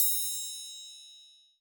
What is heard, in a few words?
popup shorter